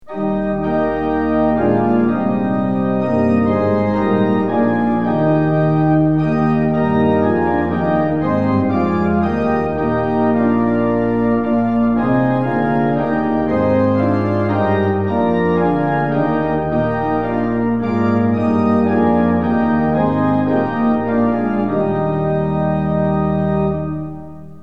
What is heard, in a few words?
tune; hymns; lillenas